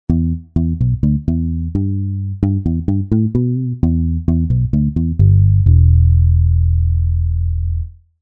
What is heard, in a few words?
Mute Bass; TURBO VL